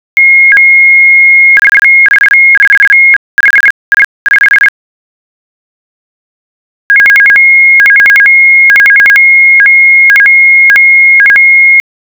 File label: imts call